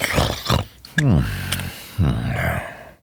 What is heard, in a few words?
Snores, Father, Vocalizations; Warframe; Deimos